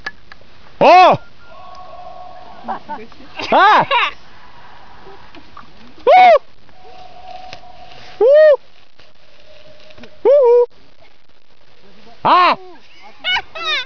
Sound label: echo